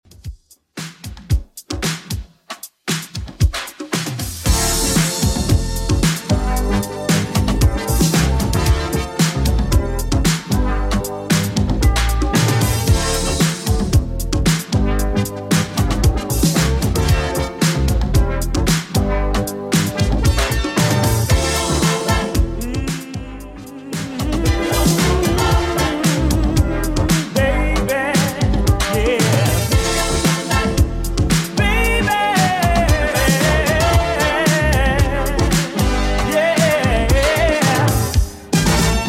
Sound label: 80s Redrum, 114 bpm